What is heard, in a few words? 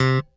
C2 SHORT, STIK BASS; D-STIK BASS2